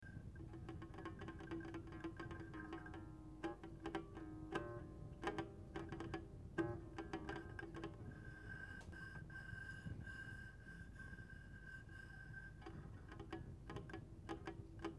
ongle, harpe

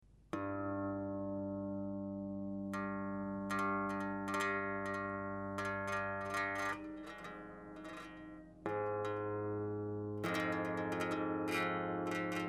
tige zing, touchers de la harpe

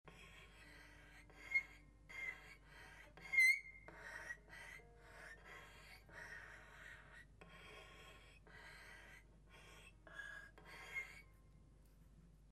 gliss doigt, harpe

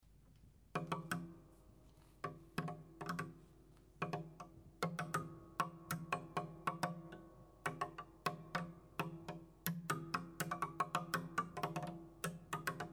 tige roulement, touchers de la harpe

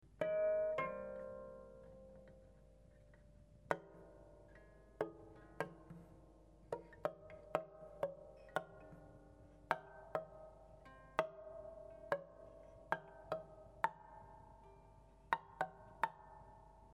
gouttes, harpe

